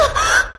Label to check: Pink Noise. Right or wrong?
Wrong